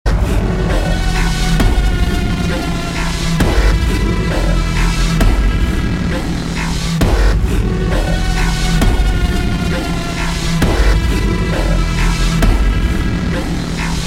Theme Music, Ringtones; Darling 2; Tamil

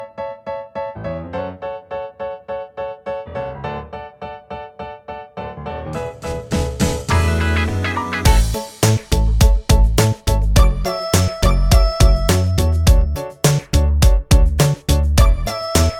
Musical, Ringtones; Tamil Ringtones; Saithan

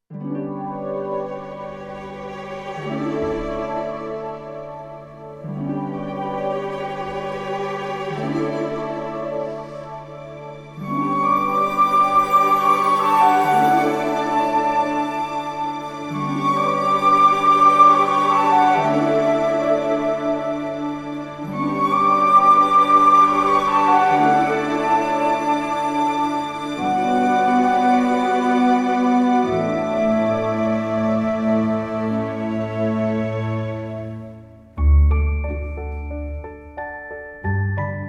Uttaman & Karpagavalli (instrumental); Uttama Villain; Tamil 2015

